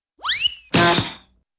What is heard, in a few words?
slip whistle honk and cymbal crash